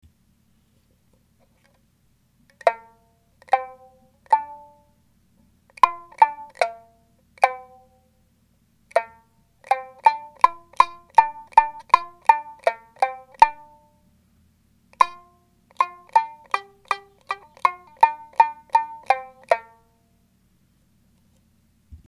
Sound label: ichigenkin; ゴミ線; リサイクル楽器展示室T（吹く楽器 はじく楽器）